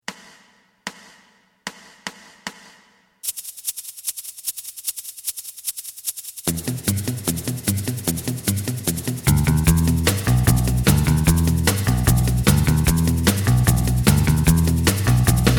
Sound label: lead